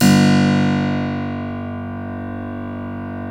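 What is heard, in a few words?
G0 clavinet right, Keyboards Acoustic; USB Soundscan vol.17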